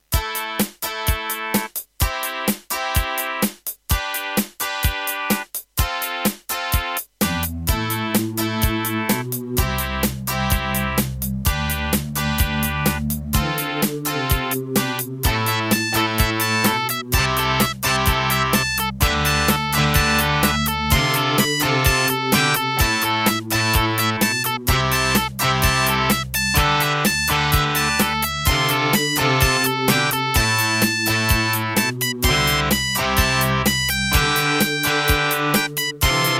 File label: Wave 1 [Terratec MiniWaveSystem TTWT]; Raptor